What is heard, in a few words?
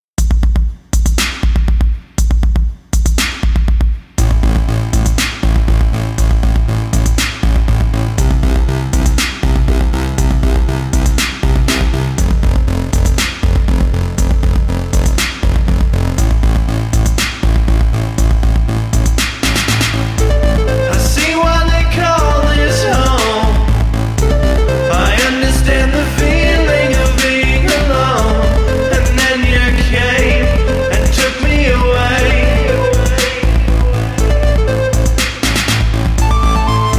claps